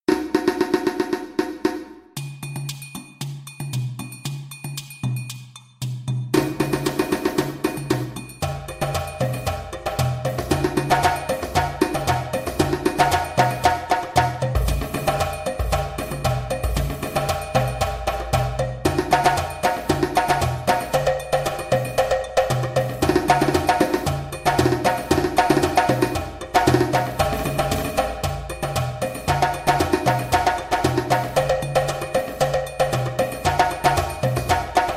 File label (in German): Donsole Arrangemente 230 bpm, djembe